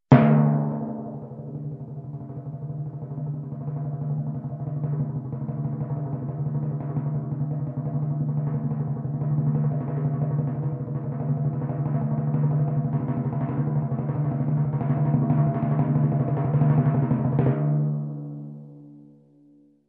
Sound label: Long soft timpani crescendo